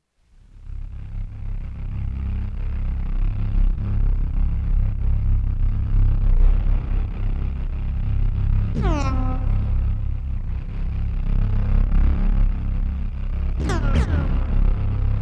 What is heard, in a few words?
sdrone